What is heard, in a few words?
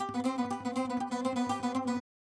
saz